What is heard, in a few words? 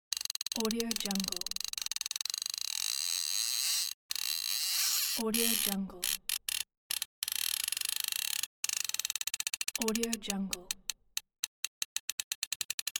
fishing reel